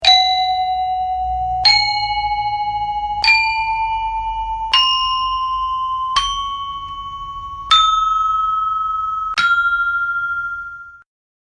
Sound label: scale